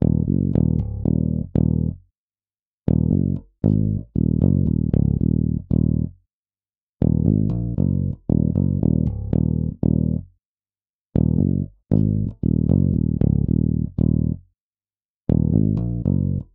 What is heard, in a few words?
Bass